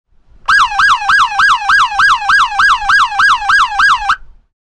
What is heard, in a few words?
Yelp